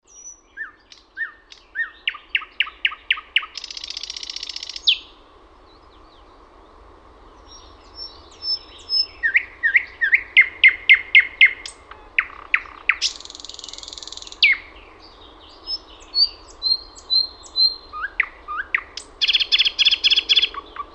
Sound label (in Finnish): SATAKIELI